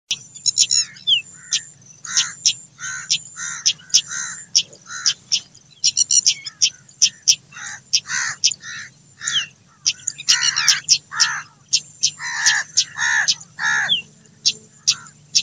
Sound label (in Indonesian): suara alarm burung decu